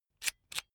electric drill template slide in slow; maschinen; bohrer; soundpool

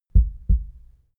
heart beat double slow; comedy-cartoon; koerpergeraeusche

